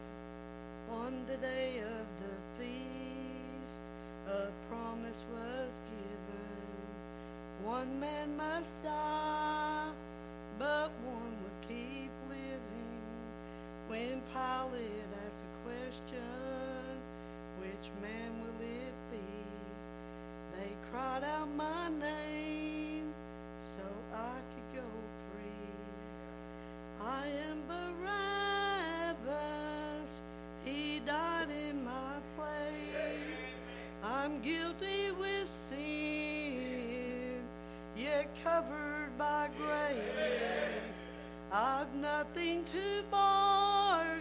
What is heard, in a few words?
singing